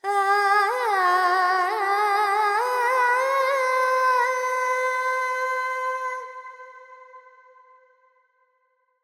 Carnatic Female Alaps, Panthuvarali, 100 G, FX

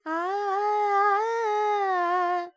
Pentatonic, Vocals, Carnatic Female, Alaps, Dry

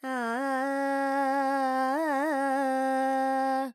Charukesi, Vocals, Carnatic Female, Alaps, G, Dry

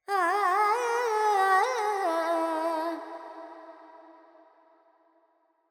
FX, Vocals, Carnatic Female, Alaps, Charukesi

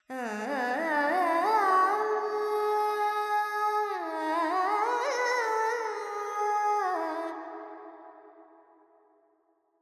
Verb Phrygian, Vocals, Carnatic Female, Alaps

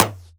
Perc; Sample Banks; Percussion

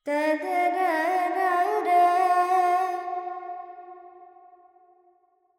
Verb Dorian, Vocals, Carnatic Female, Alaps, 70 G

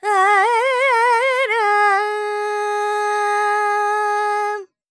Lydian, Vocals, Carnatic Female, Alaps, Dry; 70 G; Sample Banks

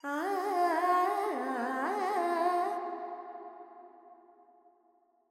Pentatonic, Vocals Carnatic Female Alaps 70 G

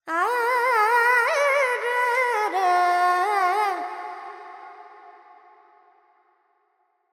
FX Dorian, Vocals, Carnatic Female, Alaps, 70 G

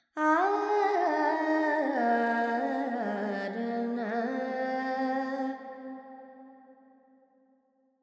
Carnatic Female Alaps, Lydian, 70 G, Verb

